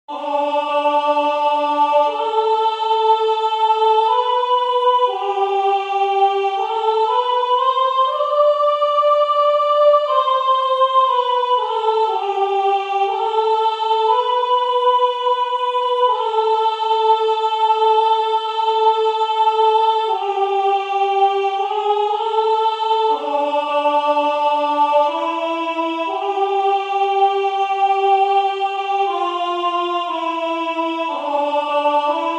1 verset 1 2 choeurs Sop 1; Monteverdi; Vespro; Ave maris stella (vespro); Baroque

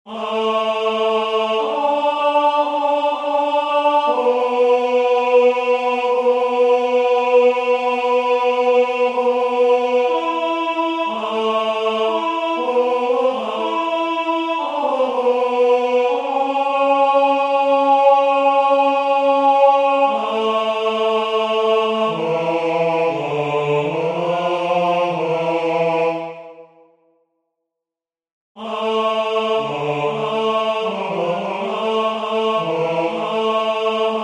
1 verset 1 2 choeurs Ten 1; Monteverdi; Vespro; Ave maris stella (vespro); Baroque

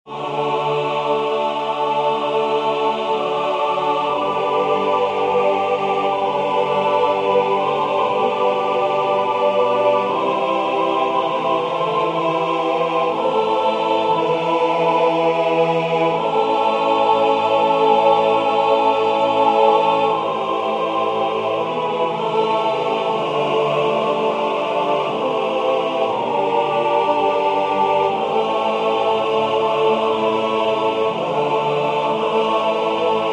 1 verset 1 2 choeurs; Tutti; Monteverdi; Vespro; Ave maris stella (vespro); Baroque

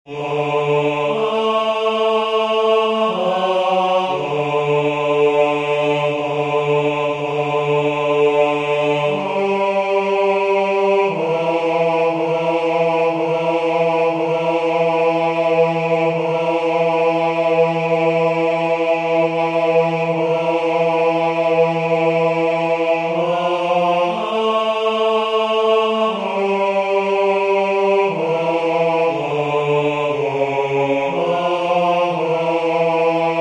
1 verset 1 2 choeurs Ten 1; Monteverdi; Vespro; Ave maris stella (vespro); Baroque

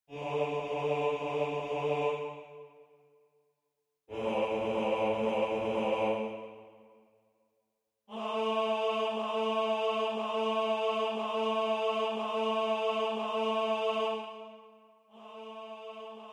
Basse; Vivaldi; Antonio Vivaldi; Gloria RV589

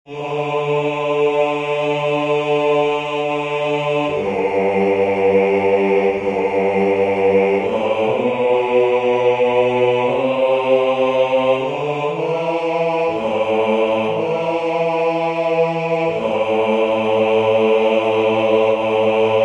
1 verset 1 2 choeurs Basse; Monteverdi; Vespro; Ave maris stella (vespro); Baroque

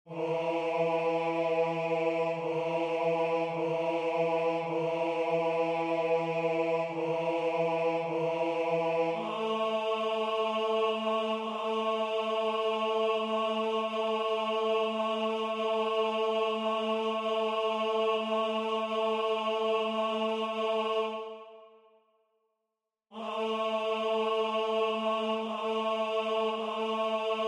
Gloria Basse; Vivaldi; Antonio Vivaldi

